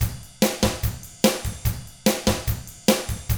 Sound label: rock drums